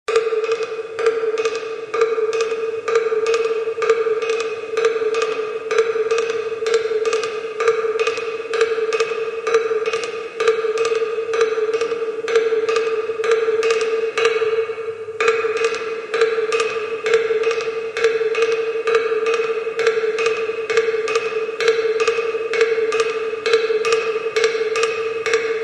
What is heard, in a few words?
Percussion